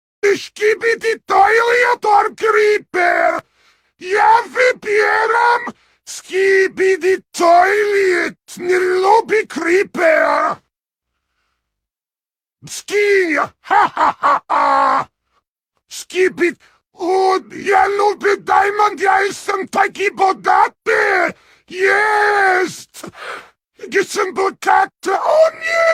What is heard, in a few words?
Ohio chant